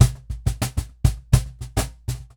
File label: Cajon Fill; musicradar